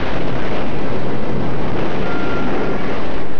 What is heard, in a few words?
EXPLODE